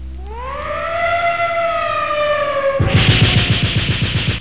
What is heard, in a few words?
siren gunshots